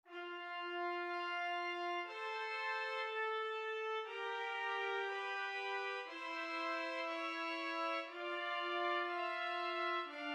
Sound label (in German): Zweistimmig. Zweite Gattung.